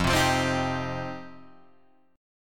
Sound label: F 7th Suspended 2nd F down Guitar Drop D